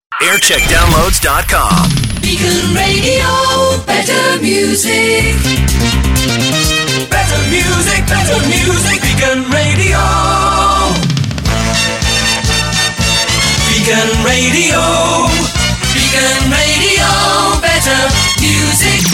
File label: jingle montage; beacon radio; 1985